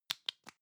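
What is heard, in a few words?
lipstick close hard, Bathroom; haus-garten; badezimmer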